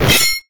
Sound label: weapon pickup slashing